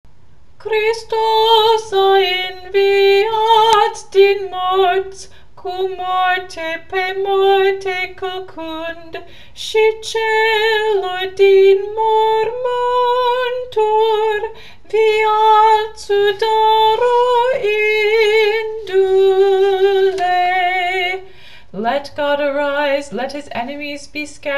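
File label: Christ Is Risen Romanian & English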